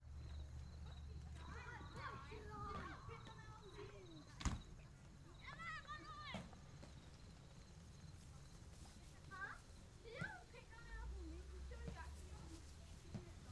Children Group Ambience

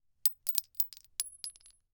Weapon Bullet 9mm Shell Drop; AdobeCC

